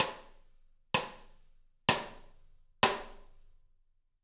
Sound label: bluebird